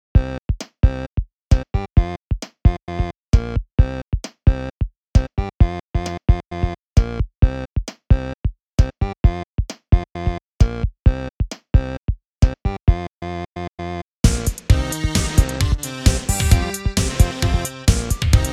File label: inst; Static Dramatic Operatic